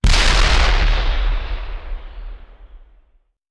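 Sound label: Artillery Explosion; Sound Ideas; Guns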